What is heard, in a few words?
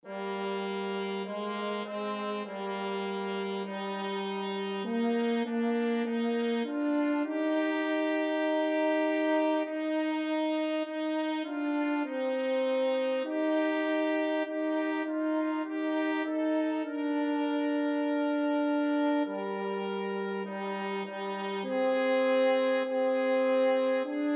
savior again, trumpet french horn duet